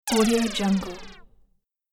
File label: app developer game sound element